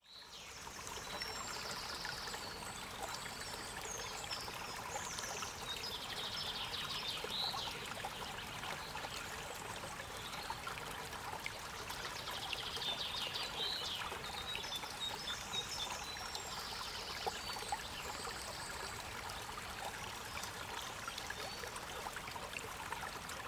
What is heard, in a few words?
ambience